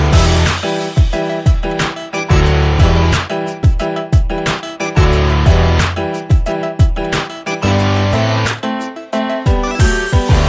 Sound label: Beat Rap